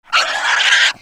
Pet Warthog Growl; creatures pets

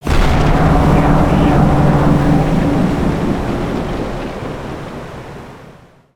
Siege Tower Impact; destructibles

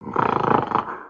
idle, creatures organic

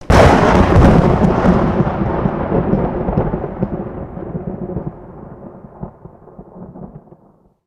GF Lightning; gnarled forest

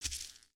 Foot Grass Walk, footsteps

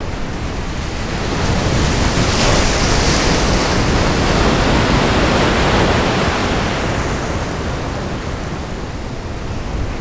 Waves Intense; ambience; pet rock

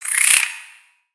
Spider Exert; Lego; creatures mechanical

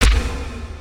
text landing; aronwk collection; ndaudio

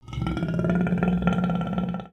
Pets Lion Idle Med; creatures; Lego

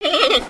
Pets Bear Panda Happy; creatures; Lego

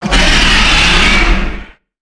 Dragon Roar; creatures organic

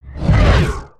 asteroid whoosh by

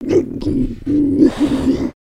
Stun; Skullkin; creatures organic

